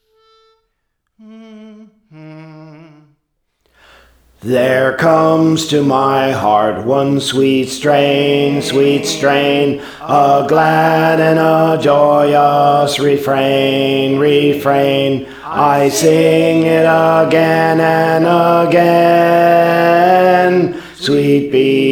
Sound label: Alto; Praise For The Lord Training